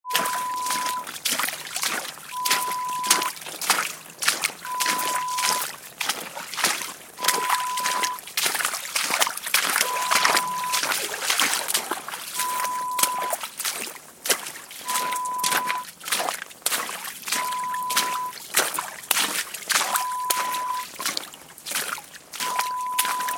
Footsteps splashing

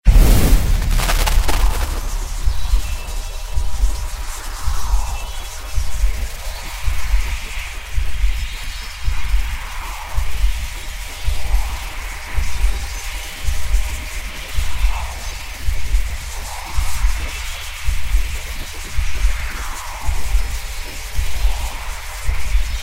SCIFI MAGIC HEAL FULL; windy beach audio

